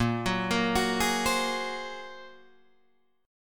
A sharp 7th Suspended 2nd Sharp 5th, down, Guitar, Drop D